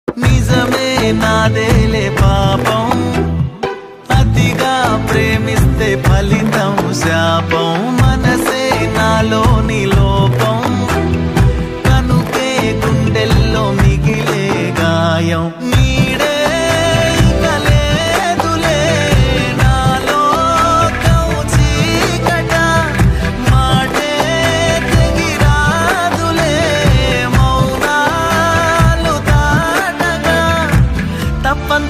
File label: telugu love ringtone romantic love song